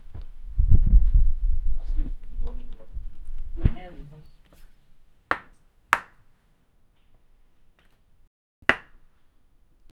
a dairy dropped on carpet, domestic

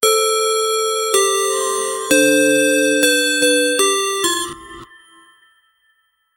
subscribe button click sound; social media

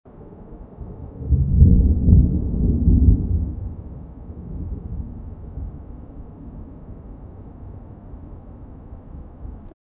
thunder rumbling in the, weather

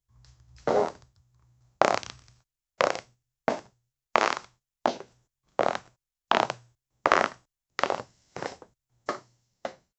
latex creaking as high he, shoes

